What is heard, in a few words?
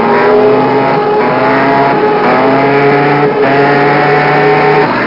Burning; WarInTheClouds